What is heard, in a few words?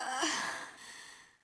Moan; Lewd SFX